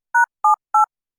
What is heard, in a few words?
Low frequency DOWN; 低周波数の音量を上げ下げできます。; 補聴器; 音量感や声の重厚感が強調されますが、上げすぎるとトンネルの中で話しているような響いた声になります; AMP補聴器調整用